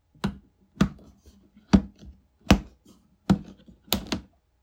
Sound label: Toolbag snap open